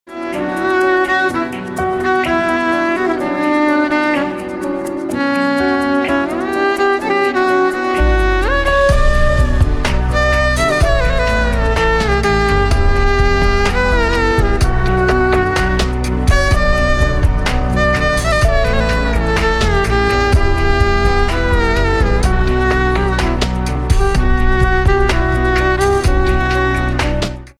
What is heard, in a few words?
ringtone flute